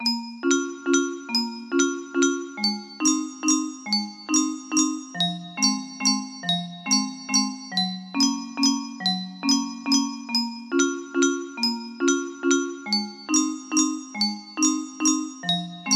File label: melody